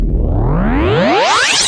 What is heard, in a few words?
Electro FX; Ueb HE Electro FX